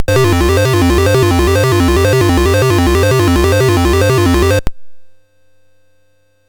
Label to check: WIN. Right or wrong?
Wrong